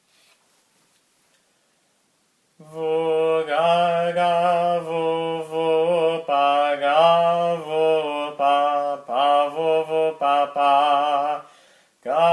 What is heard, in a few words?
Aposticha 1 - Tone 1 Slow, byzantine chant